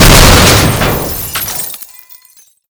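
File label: explosion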